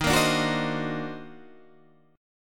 Eb Minor Major 13th, Guitar, Half Step Down

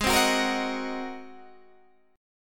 G sharp 9th, Guitar, Open D

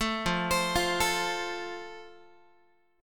F Major, Guitar; arpeggiated

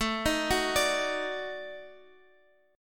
D sharp Minor Major 7th Flat 5th A

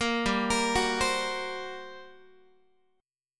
G Minor Major 7th Double Flat 5th, G, Guitar; arpeggiated; JGuitar

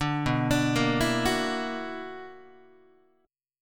B Minor Major 11th, BmM11 chord, Guitar; arpeggiated; JGuitar

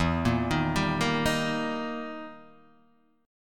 E Minor Major 7th Flat 5th

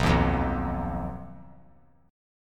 B Major Flat 5th B, chord; BMb5; strummed

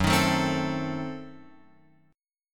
F sharp Diminished 7th F sharp Guitar Open F; strummed; JGuitar

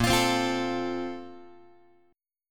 Bb Major 7th Suspended 2nd, Guitar

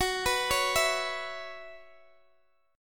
Gb 7th Suspended 4th Gb, Guitar Open G; chordshape; down

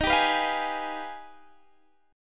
G sharp Minor Major 13th

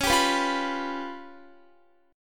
Db 7th Flat 5th, Guitar; Db7b5 strummed; JGuitar

